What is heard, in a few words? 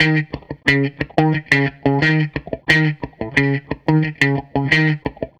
Strat Guitar Loop